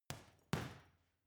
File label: boxing punching bag side hook double soft; sport freizeit; boxen